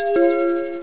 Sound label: Buddy Arrive; pidgin